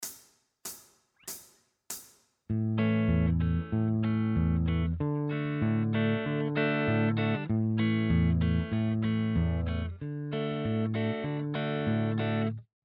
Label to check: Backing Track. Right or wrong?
Right